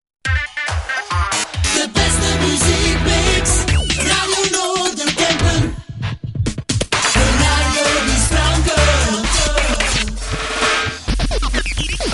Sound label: Jingles